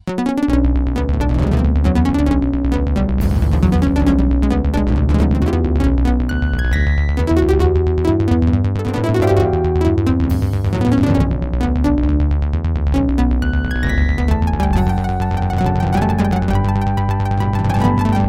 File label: korg ms2000 synth bass